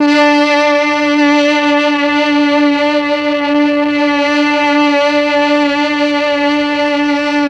MEL STRING; ILIO; Propeller Island

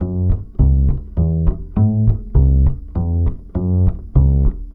BASS, Balkanish Traditions

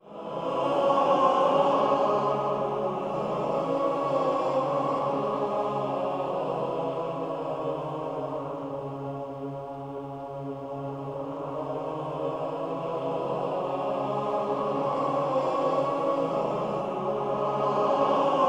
ROUND END, Voices; ROUNDS

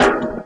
Vinyl Snare

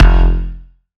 Bass F, Bass Synth

